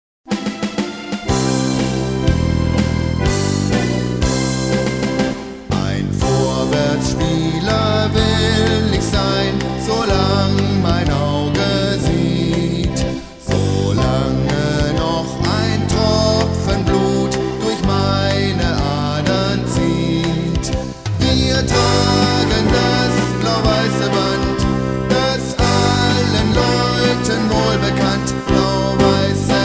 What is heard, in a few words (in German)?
Lied Gesang